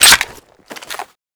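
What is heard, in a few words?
weapon foley mini uzi reload clip out; Call of Duty Modern Warfare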